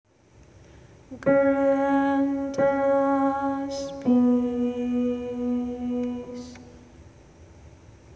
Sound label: soprano